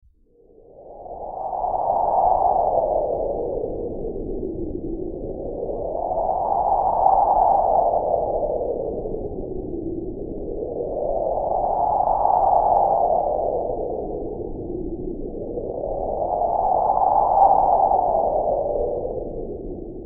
Ambient Wind; horror; horror sfx vol 1

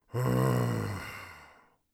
tired, orc voice; Orc Voice Pack

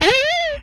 hit, vocal; MMRetroArcadeSoundsPack

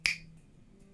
snap; abstract; Free Sound Assets

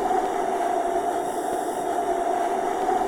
wind

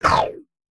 weird, sci-fi; sci-fi sfx

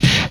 Impact, retro arcade; MMRetroArcadeSoundsPack

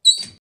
door open

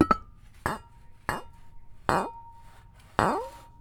Glass Glasses; BB Retail Therapy Sample Pack

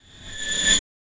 Rev Sweep; FREE SFX PACK VOL 1

